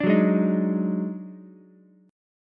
D sharp Minor Major 7th Double Flat 5th D sharp Ukulele; strummed